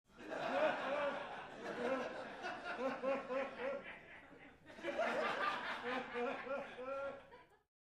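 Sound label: Crowd 100 Short Distant; medium int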